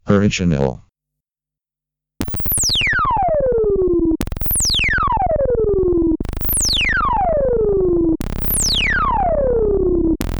MS20 Original Mini Legacy Saw Unison Max Resonance